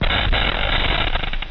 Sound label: attack; svencoop; cracklife; gonarch